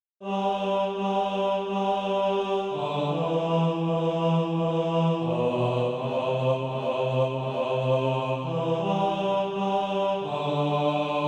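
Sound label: bass, Sheet music (SATB) including Practise Music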